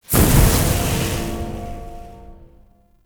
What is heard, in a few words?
Trick Ghost Slam Burst; Characters; Enemy; Abilities; Circade